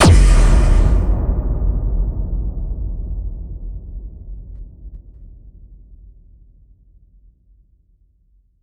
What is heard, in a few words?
bassdrum reverb FX kick; Vengeance Samples